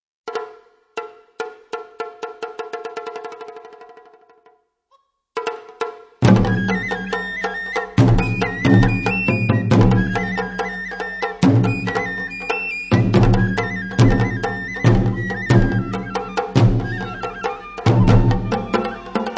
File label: Rhythm